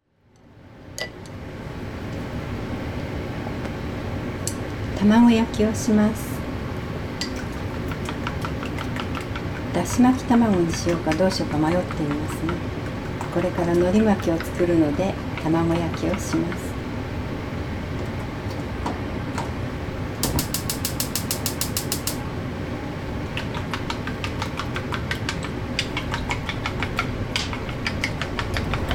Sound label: tamagoyaki; Chino Cultural Complex